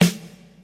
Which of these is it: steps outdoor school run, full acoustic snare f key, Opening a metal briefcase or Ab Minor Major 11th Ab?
full acoustic snare f key